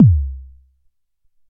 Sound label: warm sounding bass drum single hit e key